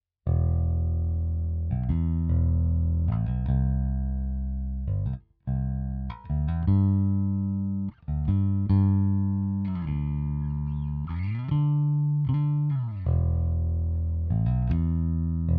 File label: marsh ss bass jensen 18db